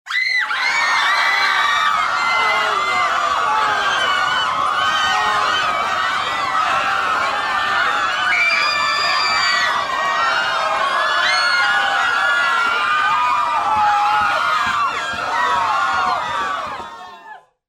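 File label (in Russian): общий переполох в клинике; Звуки сумасшедшего; идиотские звуки, которые издают психи в фильмах страшилках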